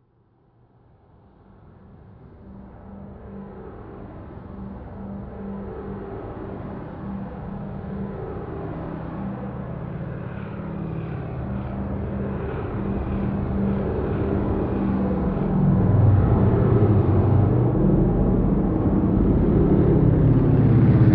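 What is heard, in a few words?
Ambient Vehicles; Red Orchestra Ostfront 41-45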